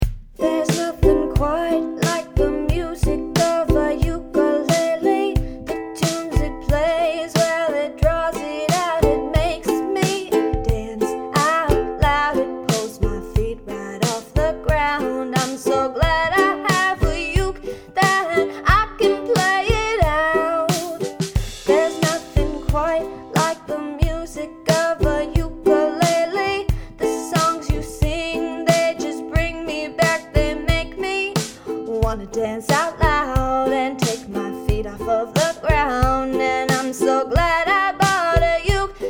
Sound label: Ukelel